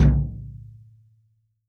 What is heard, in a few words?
BD, REAL DRUMS, JUNGLE KIT